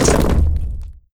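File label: ceiling down half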